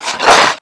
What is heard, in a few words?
dog pain